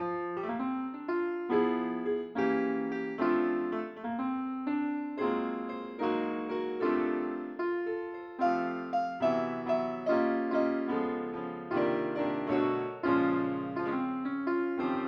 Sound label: piano